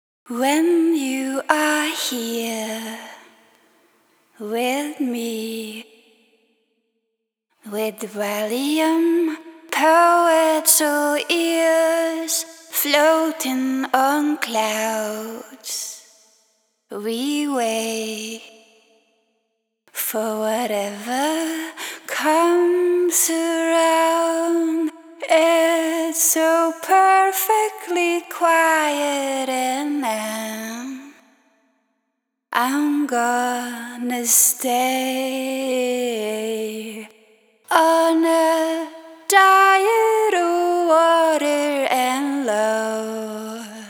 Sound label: lead vocal